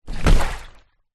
transport boat rubber dinghy small land water hard